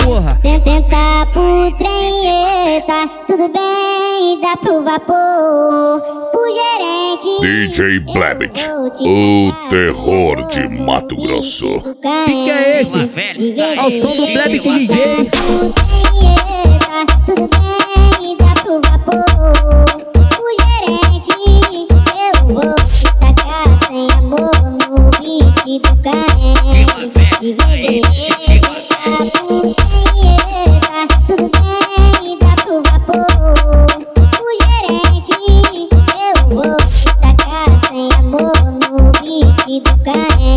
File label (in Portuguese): funk sertanejo